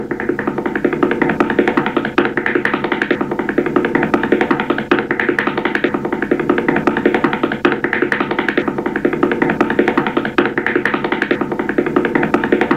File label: running; charlie brown